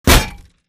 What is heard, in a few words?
bullet heavyballistic titan; Titanfall 2